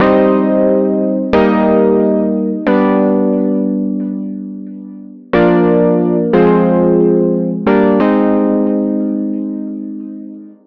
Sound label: lead